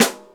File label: clicky snare single hit a sharp key, acoustic snare tuned to the A# note